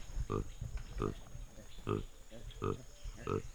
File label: Grunts